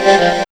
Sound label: CHOIR; X-Static Goldmine 1